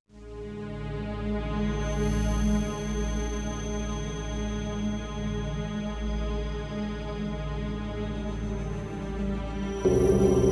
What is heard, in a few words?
ambient track; Starship trooper; music